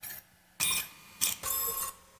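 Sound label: Background Beeps Noise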